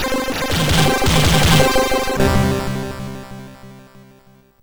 MOS6581R4, sid

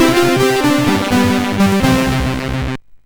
sid MOS6581R4

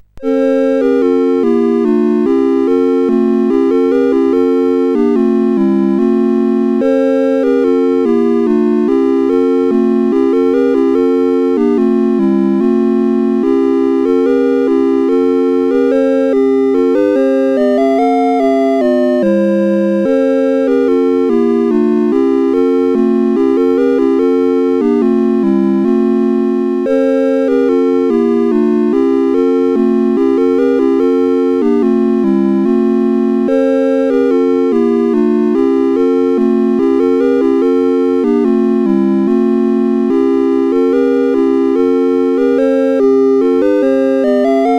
Deck the Halls, CSG8580R5; Master Composer; Deck the Halls v2

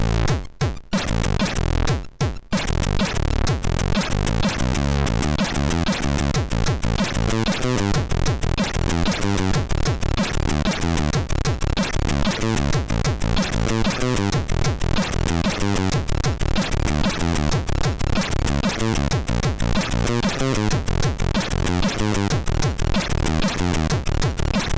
sid MOS6581R3

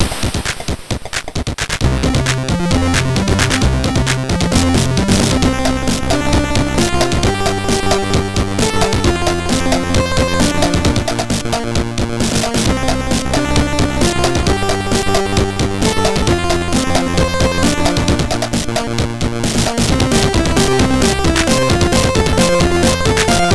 MOS6581R4, sid

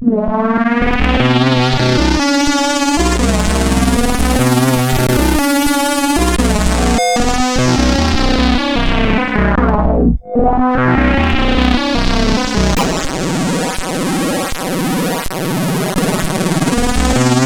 Noisy Intro, CSG8580R5; Horizon Noisy Intro

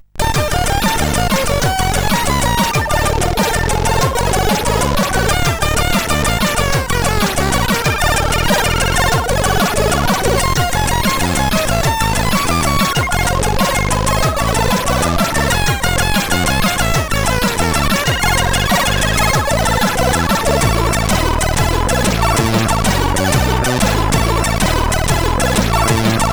sid CSG8580R5

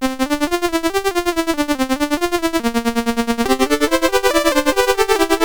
Techno-Rock Fugue, CSG8580R5; Latimer Joey; BASIC

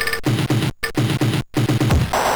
sid MOS6581R3; Eprom; Going Dutch part 2

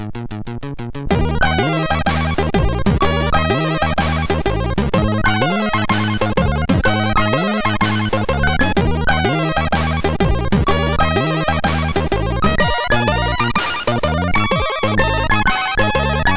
Blues sid MOS6581R2

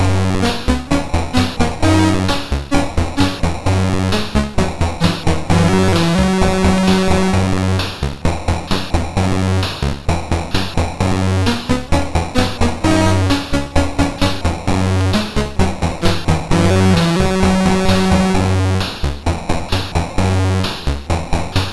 Synth Sample III, MOS6581R2

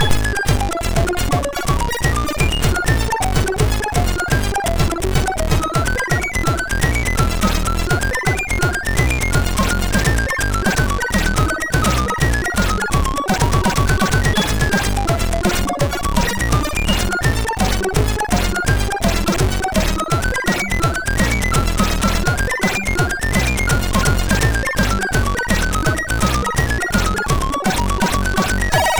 sid MOS6581R3

